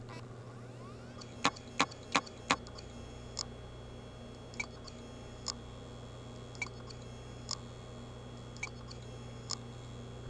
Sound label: hd sounds, laptop, bad; mac disasters